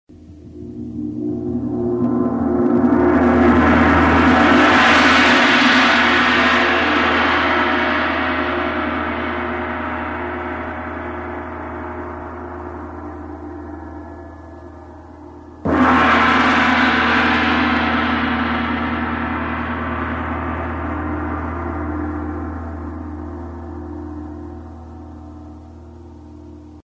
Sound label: gong; PERCUSSION INSTRUMENTS